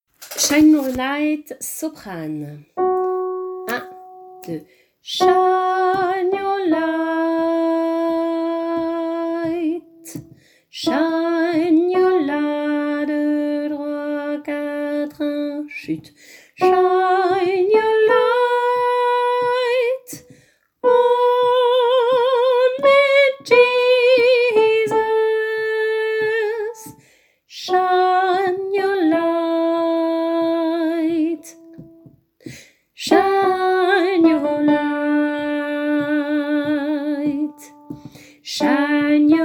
refrain soprano

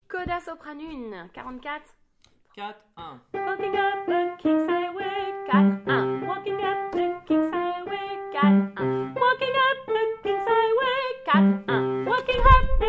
coda soprano

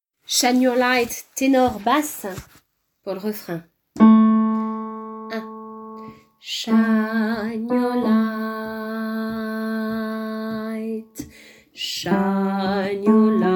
refrain basse

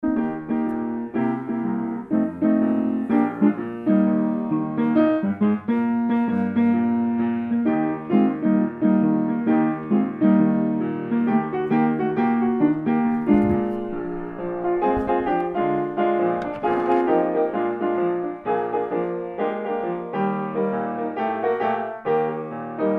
Sweeter as the days go by accompagnement

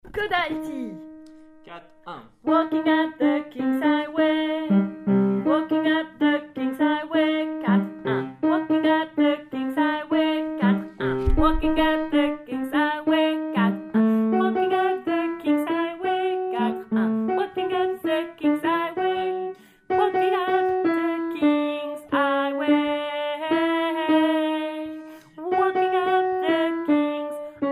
It's a highway to heaven coda alto

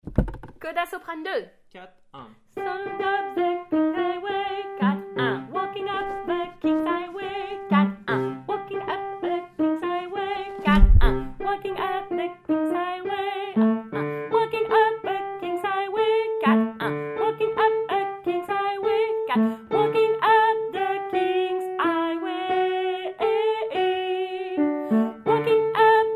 coda soprano

